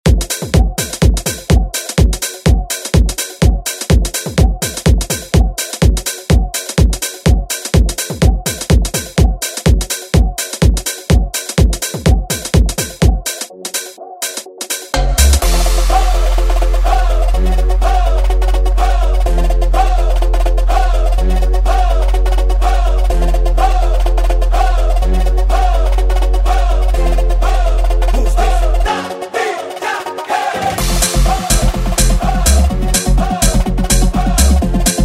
Tech House; Deejay Hit

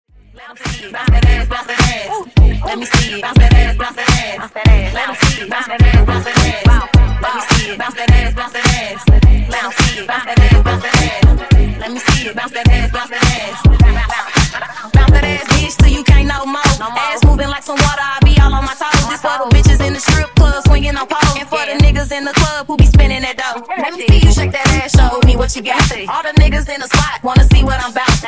Bounce Funk Mashup 105Bpm

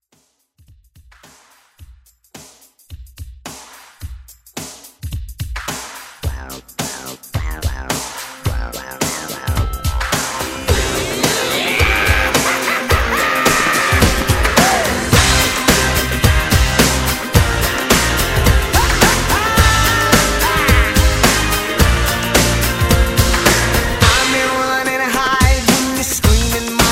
90s Pop; Redrum

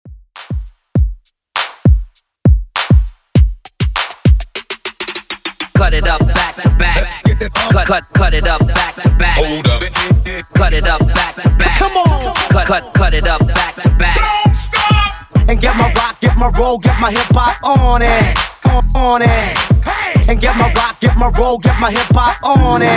Mixshow Edit 100bpm

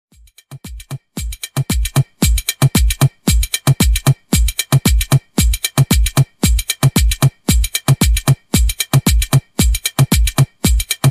114 BPM INTRO

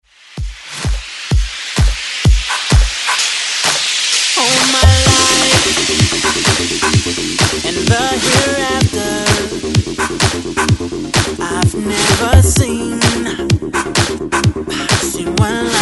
Re Drum 129Bpm

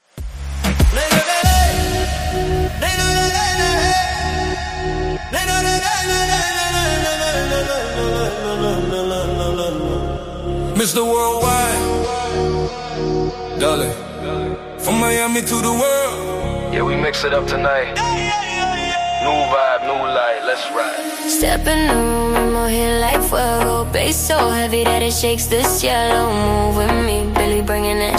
reggaeton

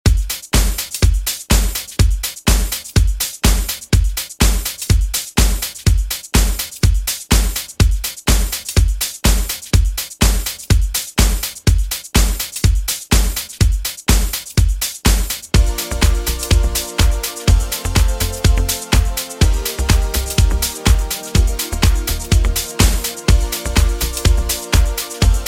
80s Redrum, 124 Bpm

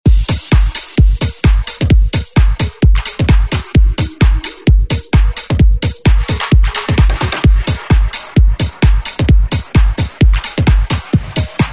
Latin House; Redrum

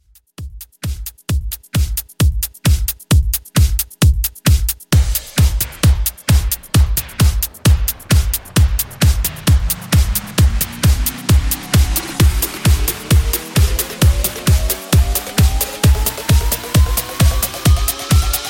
90s Dance House 132Bpm

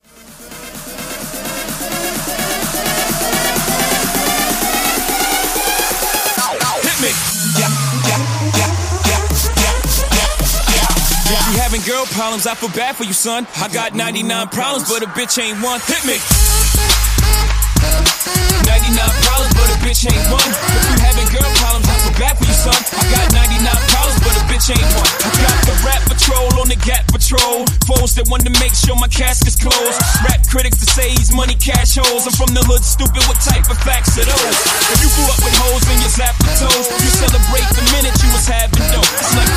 transition 128 103 bpm Abm

